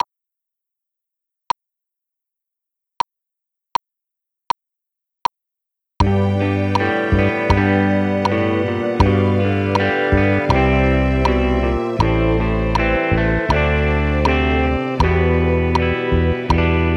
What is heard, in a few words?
Guitar